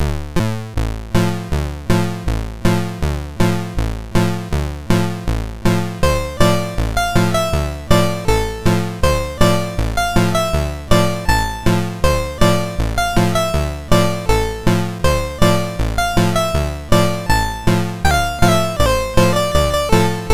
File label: Waltz, sid MOS6581R4